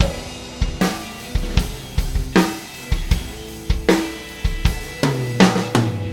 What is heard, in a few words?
loop